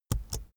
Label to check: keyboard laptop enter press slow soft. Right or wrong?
Right